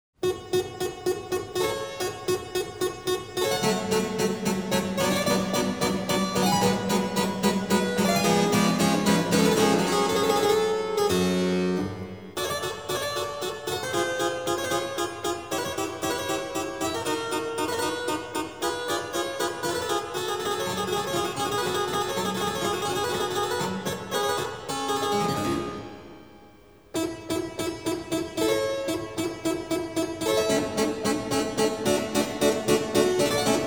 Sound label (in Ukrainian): klavesin; Ramo; Kurica